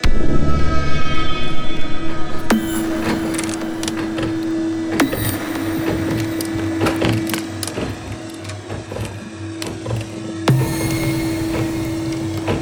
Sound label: Slippage Loop